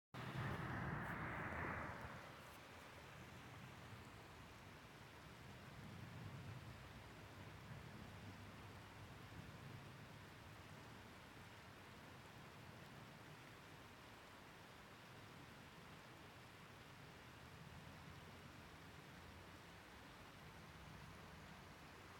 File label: falls sledding hill; berea